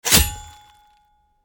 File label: sword